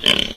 pig golden, mammals; animals; stamps

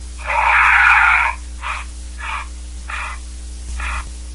doe, deer; animals; mammals; stamps

